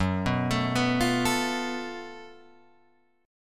Gb Minor Major 7th Double Flat 5th, Guitar, Open G, chord